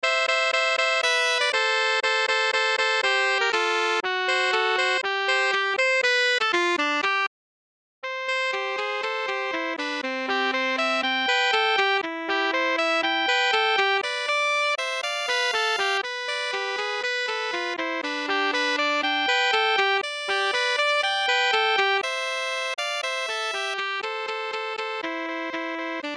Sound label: Sax Duet